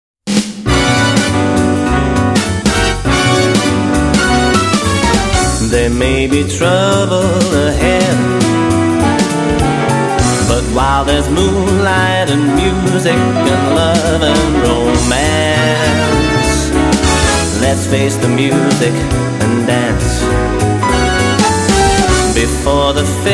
Swing Singer

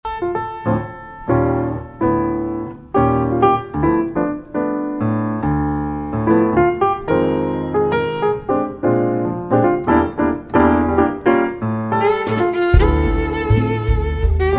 Solo Violinist

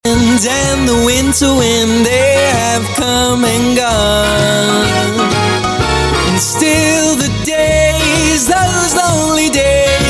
Swing Singer

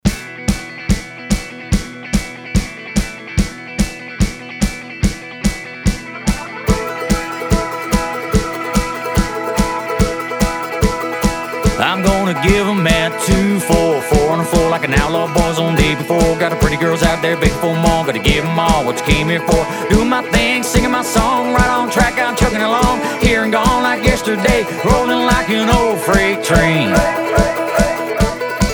Modern Country Rock Band UK, Live 4 6 Piece Band

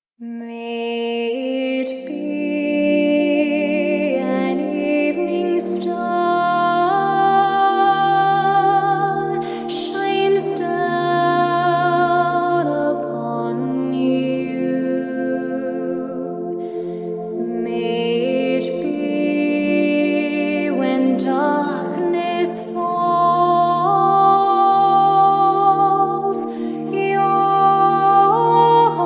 Soprano

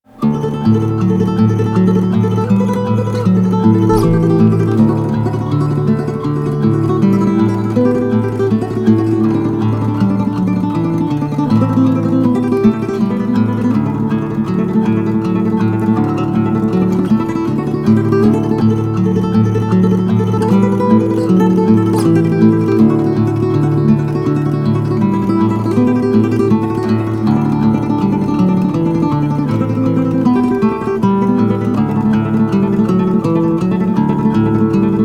Guitarist, Spanish Guitarist

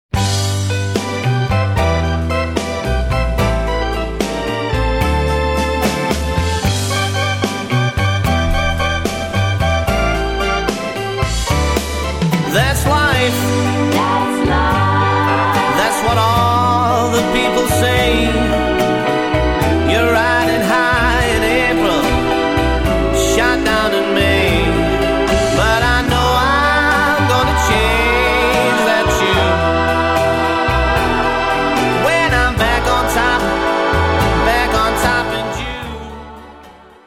Swing Singer

